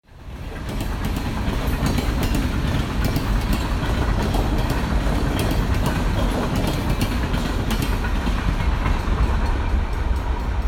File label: Train Crossing Depot Road